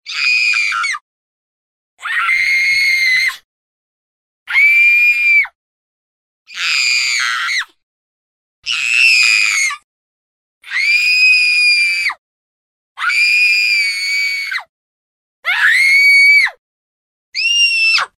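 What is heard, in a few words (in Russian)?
отдельные крики и визги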